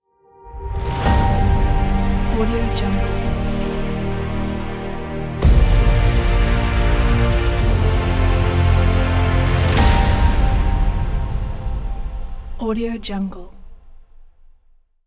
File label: epic intro ident; marblespace